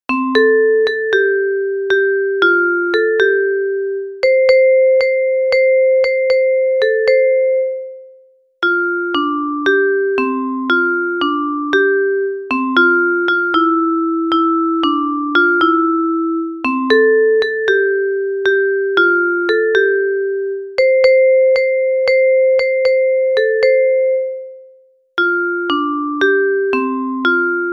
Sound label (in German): Pinguin Kanon Vibraphone